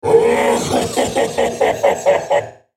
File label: laugh; BattleCastChogath; Character Voices - English